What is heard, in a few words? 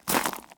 zombie footstep; DeadFright